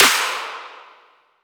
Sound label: snare ceramic duo